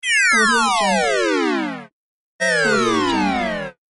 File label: 8 bit fall dawn